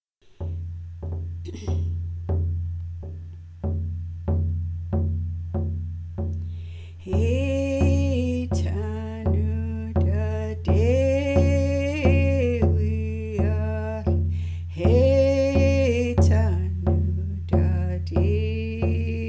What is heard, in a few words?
Prayer Song